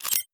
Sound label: Menu Weapon Assemble; Custom UI Sounds; Call of Duty Black Ops 3